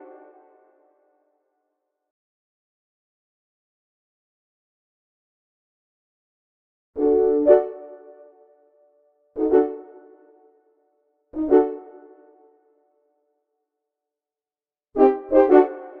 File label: French Horn